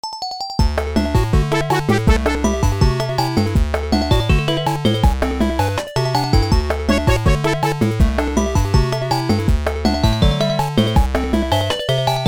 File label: Sega Genesis Remix; Mega Man Battle Network 3